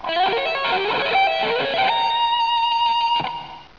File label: Sweeping and Sliding a Diminished Minor Arpeggio, Guitar